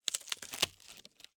Cardboard, honeycomb, crush, handle, short; Eiravaein Works; Vaeyan IV

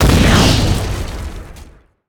Explo Medium Powder Keg; Destruction

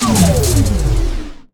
Scifi Power Down; Energy & Forcefields

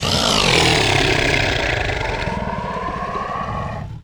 Scifi Alien Energy; Energy & Forcefields

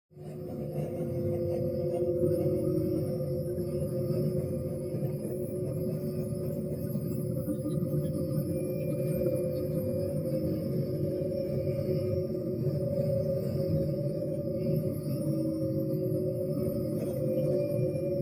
AMBIENCE HIGH Through Glass; NEON; boom library

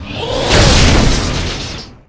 Rocket Launcher Shot; SFX Kit; Weapons

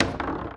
Foosball Ball Hit

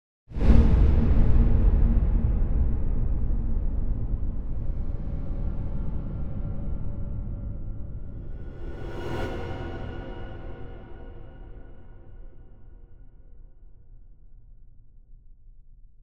Impact Distant Dull Blunt Scary Short Impact; Hollywood Edge; sound designer's toolkit v2

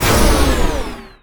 Scifi Forcefield Deactivate; Energy & Forcefields

